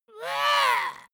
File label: dwarf disgusted